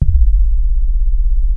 RESO C2, BASS; Q Up Arts; Dream Experience

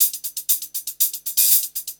120 HIHAT

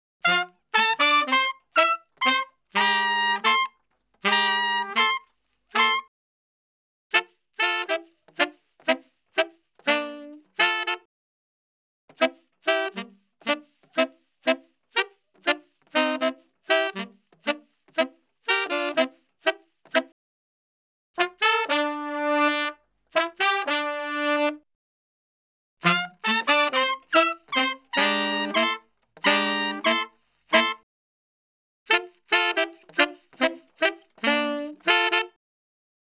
Salsa Picante; Masterbits; World Zone VOL-3

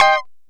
Lounge Guitar Chick 9ths F4; Lounge Guitar Chicks

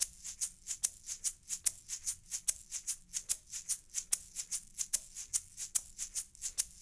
LOOP, SHAKERS, Percussion

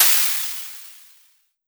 D&B Cymbal, Drum & Bass Kit; essential drumkit

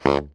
BARI FF C#1, Sax; Greytsounds - Philip Wolfe David Crozier's Saxophones; BARI SHORTS

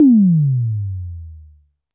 korg trident

